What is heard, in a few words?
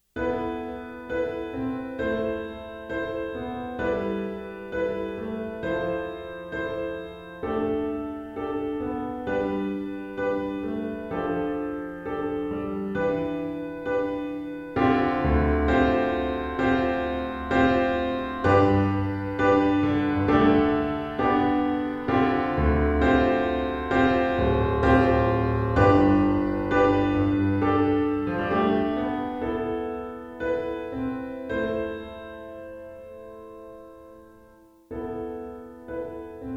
piano pop